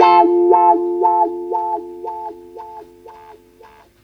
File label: GTR1, Roots Disco; USB Soundscan vol.32; AKAI